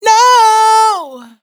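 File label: riff no E minor, Vocals, Dry Vocals; Raw Vocals; Slate Digital Omnivox Vocal Sample Pack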